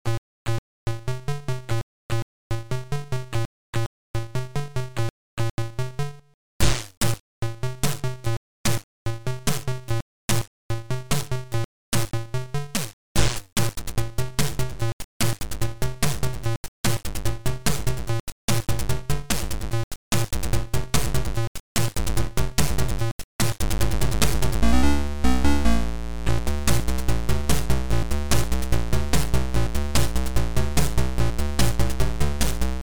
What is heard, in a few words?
Cacaphon